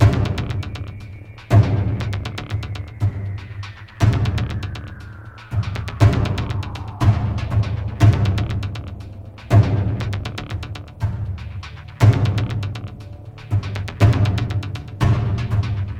Music Intro Battle Boss

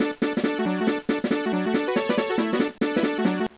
Microgame - Grabbing

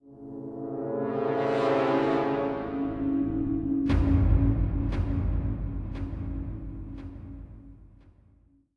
Music Shorts

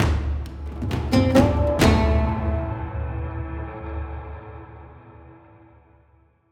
Combat end; Desert Winds

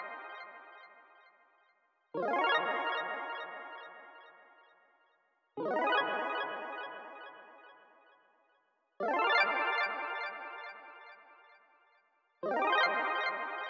Balls Organ

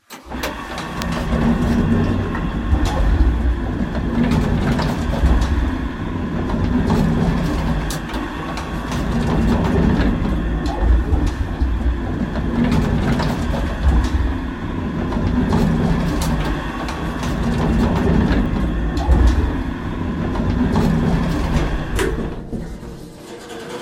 elevator; Five Nights at Ronald's

